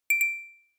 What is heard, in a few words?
camera focus, ui; Vodafone; Smart-First-6